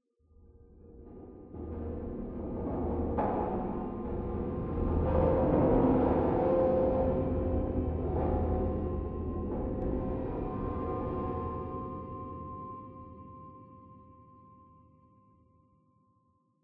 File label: Interior Metal Drone